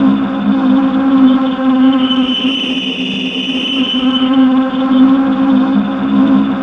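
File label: brite buzz, ambient feedback; Spectrasonic Distorted Reality 1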